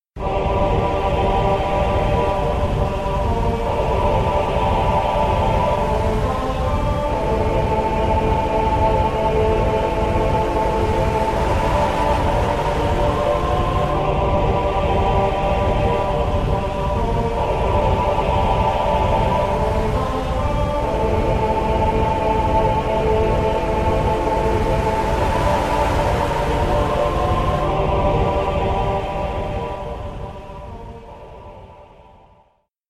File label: The Wind is Howling